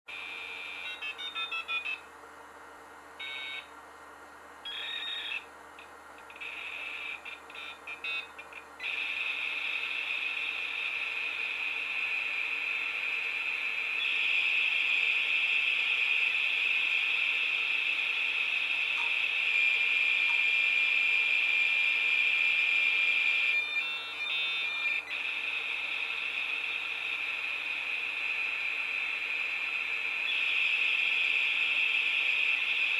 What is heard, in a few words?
Technology Modem Dial Up Sign In; Computer Sound Effects; Computers (Sound Effects:Electronics); SFX Bible 6